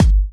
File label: Hard Kick; Vengeance Samples